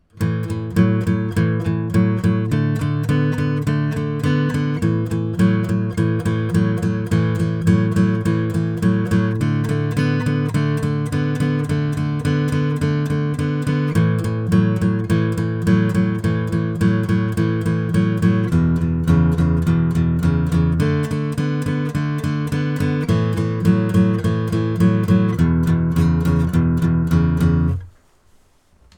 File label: A straight 8th; 12 bar blues in A